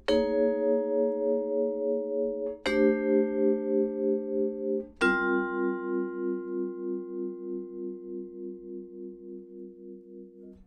Vibraphon 90 bpm E

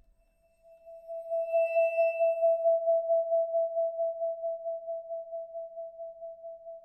Vibraphon Bow B

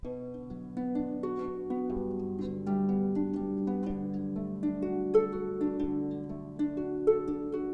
Harfe 124 bpm A

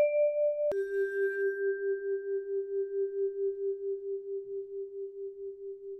Vibraphon Bow E

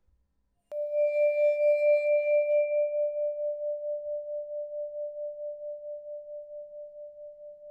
Vibraphon Bow C

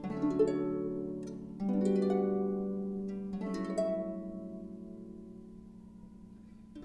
Harfe 70 bpm

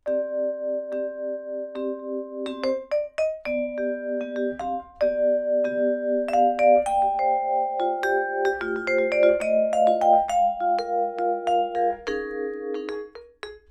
Vibraphon 70 bpm A

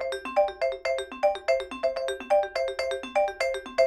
Vibraphon 124 bpm C

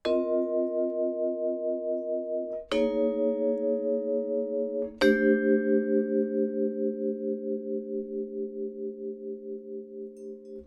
Vibraphon 90 bpm D

